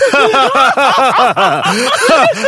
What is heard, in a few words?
heavy laughter big